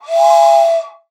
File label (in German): ZUG PFEI; Best Service ProSamples vol.42